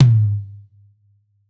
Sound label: Rack Tom